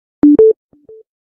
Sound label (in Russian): zariad samsung s9